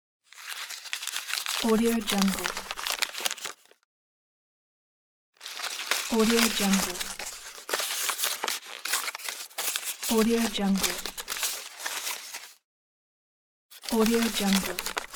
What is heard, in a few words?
unwrap gift